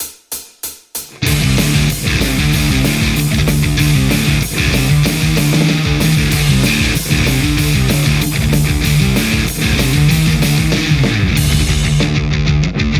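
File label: metal Stratocaster JCM800 hot; mercuriall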